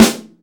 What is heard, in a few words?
dry acoustic snare sound g sharp key; snare drum